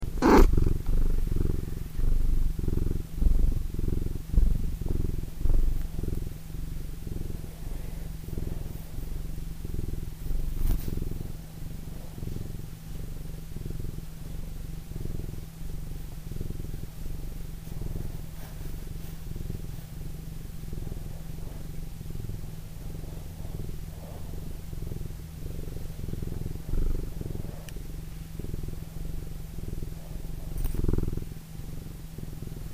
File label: Purr